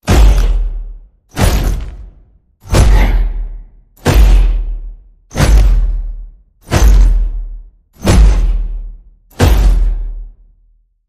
Giant Sasquatch Stomps

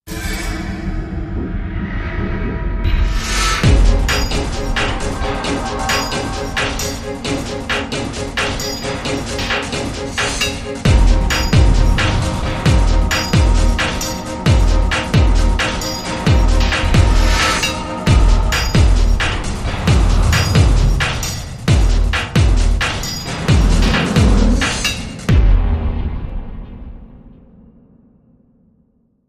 Metallic District

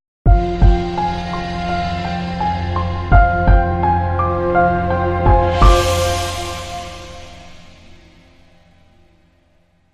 Crime Sting

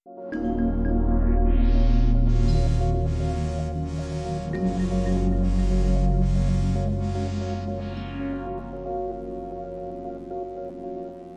with Beat

